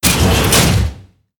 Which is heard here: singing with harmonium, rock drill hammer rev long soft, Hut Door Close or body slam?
Hut Door Close